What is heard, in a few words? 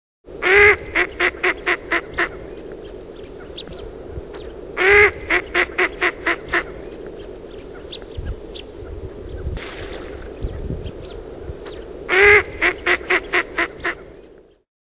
australian shoveler